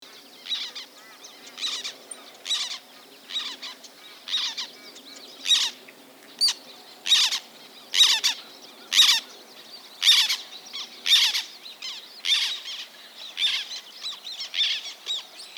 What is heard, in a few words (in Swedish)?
Svarttärna